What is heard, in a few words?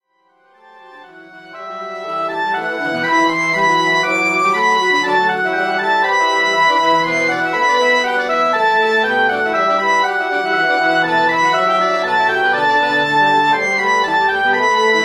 Wind Ensemble